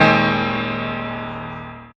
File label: Piano Chord; Zero-G Jungle Warfare 1, 2 & 3